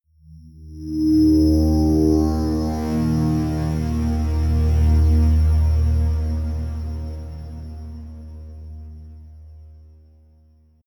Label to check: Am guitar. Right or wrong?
Wrong